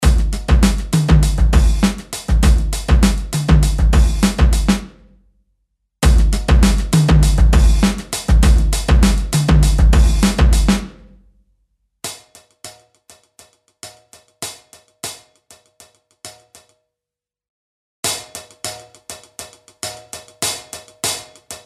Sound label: Feedback Compressor; MASCHINE STUDIO